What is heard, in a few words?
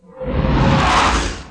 Slam; ImpGal2; groundwar